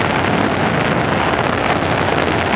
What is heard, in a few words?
Rocket Loop; MagicItem Rocket SFX Loop 01